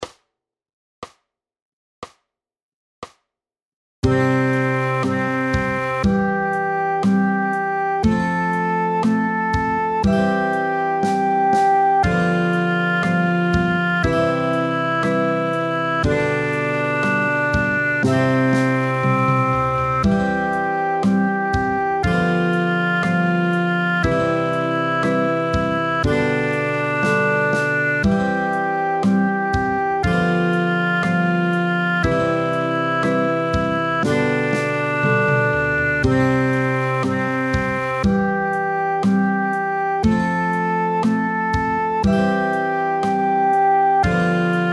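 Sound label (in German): morgen kommt der weihnachtsmann playalong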